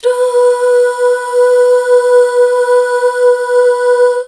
DUUH FM R, Hallelujah, BACKGROUND

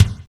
KICK, Tekno Industrial; East West

